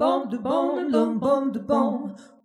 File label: Jazzy Harmony